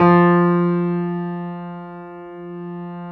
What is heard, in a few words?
PIA GRS, PIA_GRAND, Roland